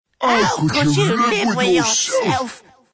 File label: death; dota; ogre magi